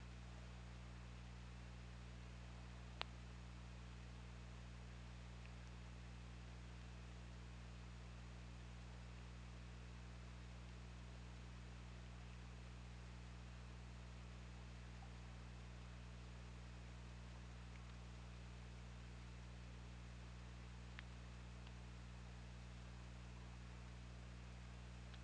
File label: Orca; OrcaLab